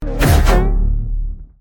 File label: error